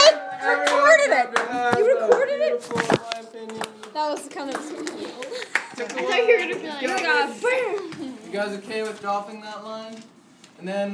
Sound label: wee choir